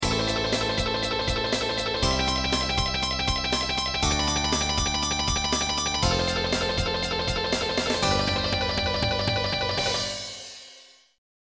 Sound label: Tap Chord Licks; Tapping Licks